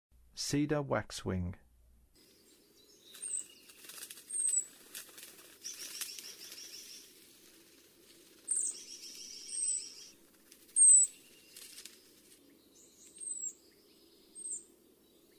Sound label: Cedar Waxwing, Western USA Songbirds; North America